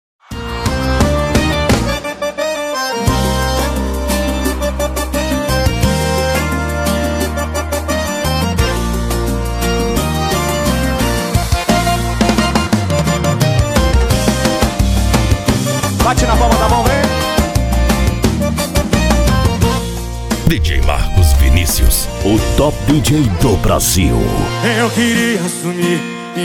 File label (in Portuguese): sertanejo sofrencia e romanticas